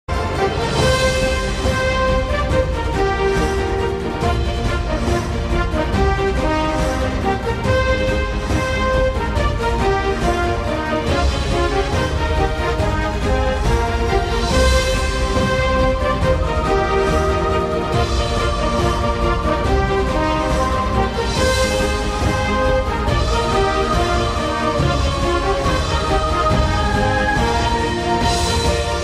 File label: Celtic Music